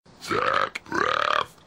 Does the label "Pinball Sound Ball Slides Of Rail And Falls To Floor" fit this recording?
No